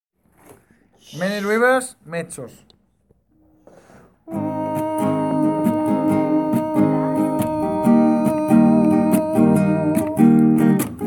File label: mezzo